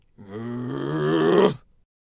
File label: Attack Vocals; Ullr